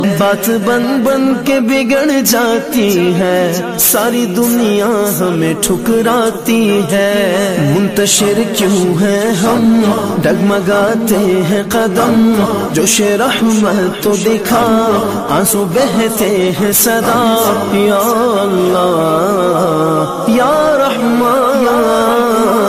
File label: Islamic Ringtone